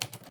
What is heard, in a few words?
click